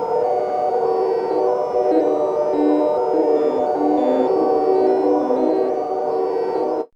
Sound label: COSMIC VOIC; AMG - Vince Clarke Lucky Bastard